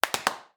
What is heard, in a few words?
Slapping Three Faces